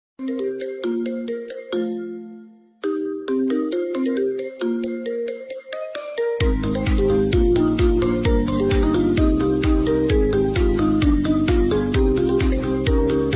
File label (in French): musique d anime ringtone; android